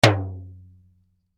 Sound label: toy drum hit; Music Production Loops; Miscellaneous Loops; McKinneySound